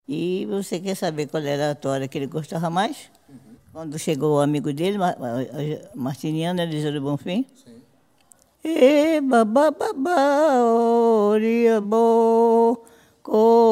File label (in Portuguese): saudacao a oje ladde